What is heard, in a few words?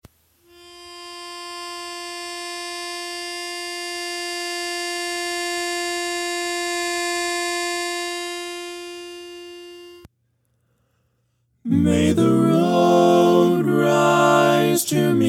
irish blessing tenor